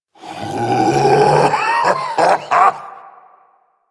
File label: Ability Lift Laugh Lich; Warframe; Kuva Lich